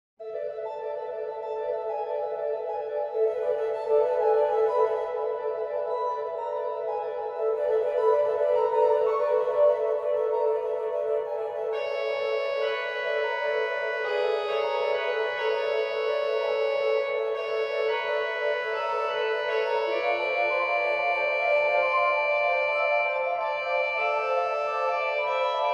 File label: Flutes clairon; organteq1